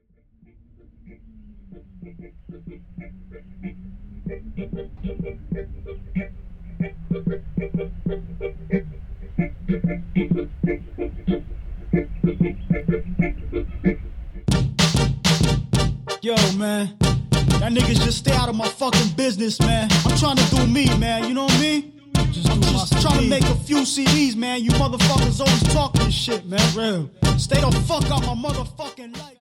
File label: Clap